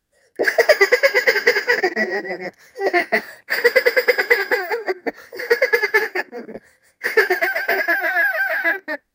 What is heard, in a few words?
puppy laughing, dog